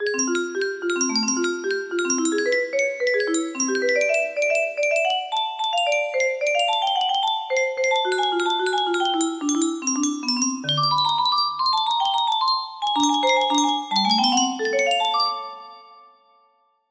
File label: melody